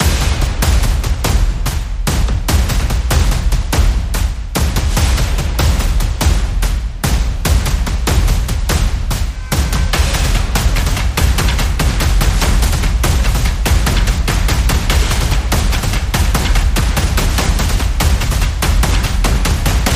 Percussion

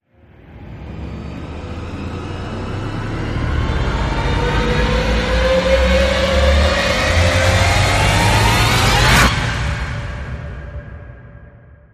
Rise

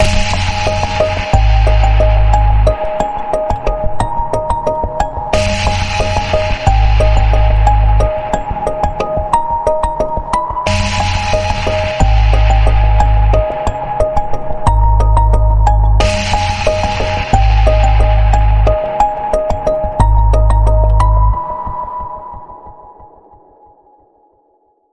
Pulse, G sharp

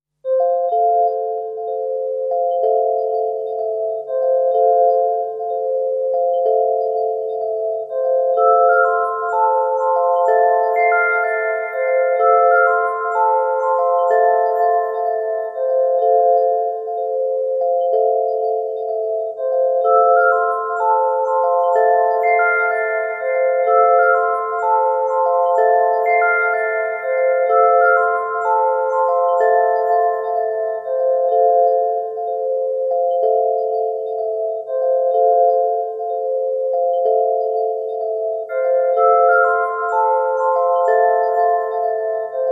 Delicate Phaze, Melodic

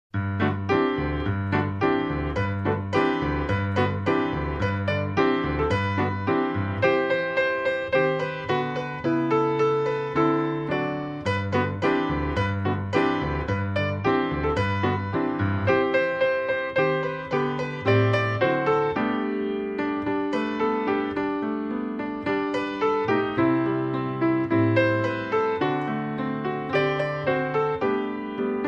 Jingle Bells Solo Piano; Trad

